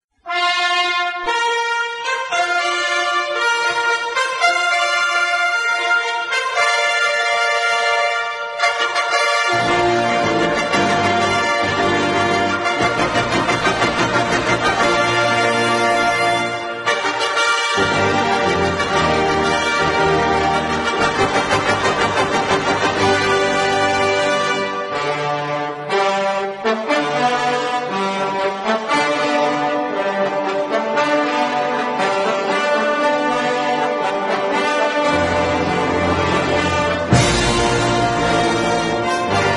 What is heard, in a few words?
Olympia Fanfare